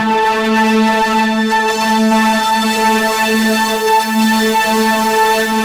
MORPHUZZ, Synthy Tonal; Distored Reality

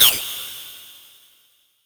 SHORT, SHORTNOIS

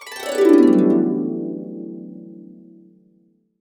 D MAJ, Harps; USB Soundscan vol.62; AKAI

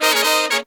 Pop & Funk Brass, 120; Best Service ProSamples vol.25; AKAI

